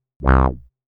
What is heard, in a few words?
Wah, synthlead